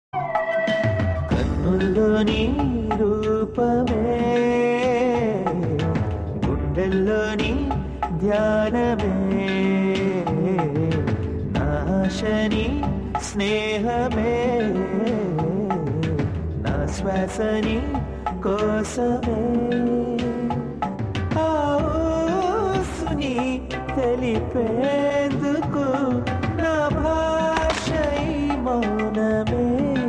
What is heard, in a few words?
love ringtone, telugu ringtone, old ringtone, melody ringtone